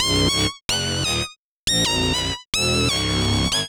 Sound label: Synths, Underground UK House & Electro